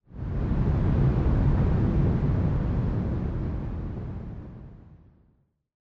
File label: Onoma Rumble Short